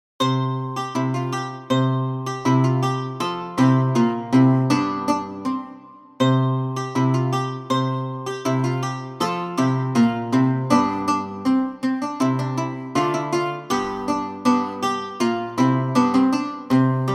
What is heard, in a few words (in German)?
Laute; grundschule musik